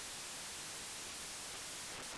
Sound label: noise